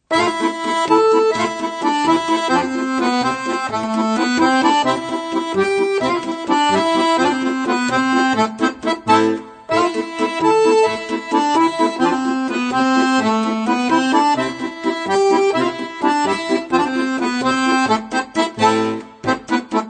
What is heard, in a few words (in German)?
Griechischer Tanz, 5/8 Takt, Akkordeon; Akkordeon Workshop Band 2